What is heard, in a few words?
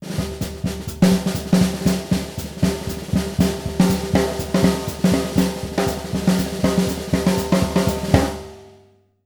BRKBT, Jazzistic